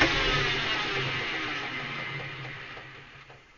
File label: rotate release; tuxpaint; magic